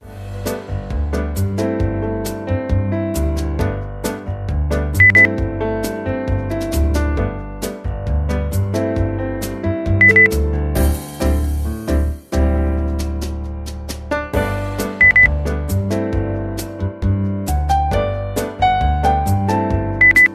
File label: jazz